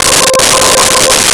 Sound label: Space Voice C5; Nintendo; July 2020 Gigaleak